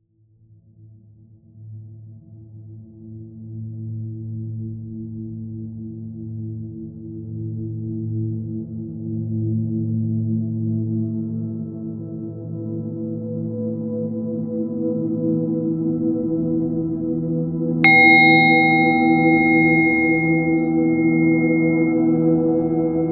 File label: Gong Interval Every 5 Minutes With Music